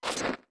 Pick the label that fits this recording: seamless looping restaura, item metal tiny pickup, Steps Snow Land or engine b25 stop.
Steps Snow Land